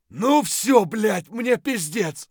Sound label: hurt near death, voice; Escape from Tarkov